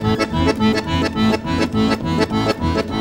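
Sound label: accordion groove; Best Service; World Colours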